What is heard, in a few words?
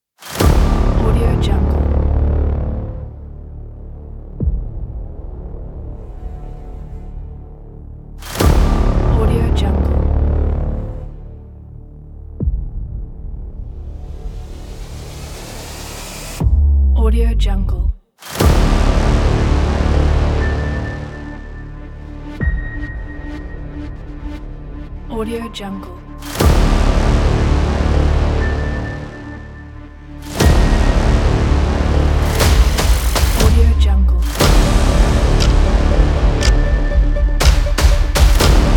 an aggressive trailer intro; soundbay